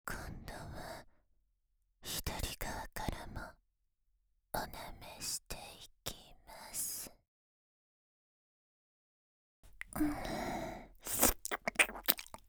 癒し重視のフェラチオ 左

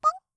ポンッ, 擬音

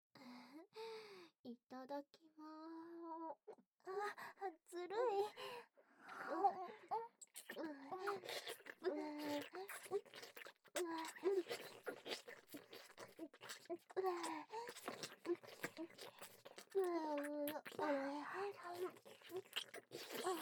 舐め咥えダブルフェラ; フェラチオ切り抜き集

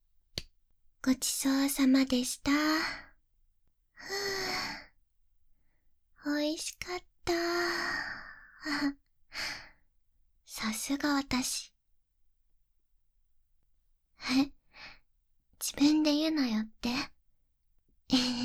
耳かきパート通し（導入含む）; お姉ちゃんに耳かきさせて～2人きりの癒しの一日～; 本編各パート通しトラック

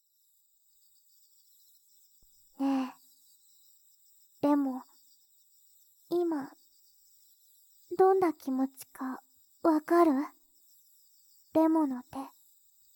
エッチな耳舐め; 夏恋その弐

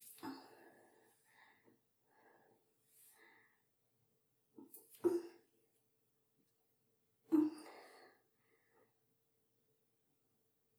お風呂場

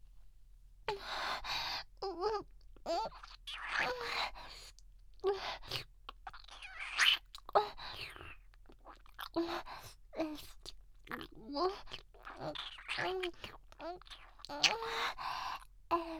舌に吸い付くキス

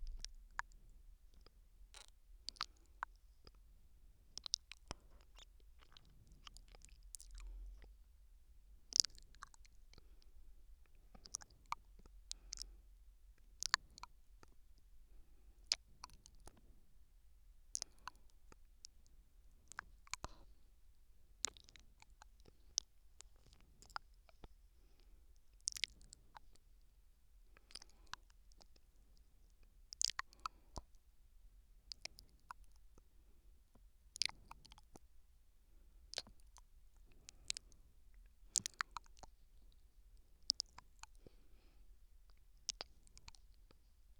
左耳 甘噛み

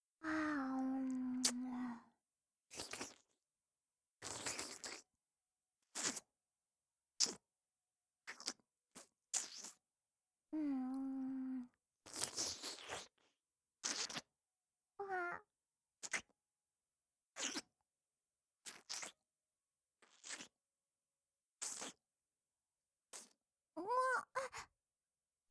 フェラ

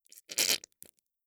射精音

